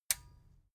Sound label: metal button stereo release disable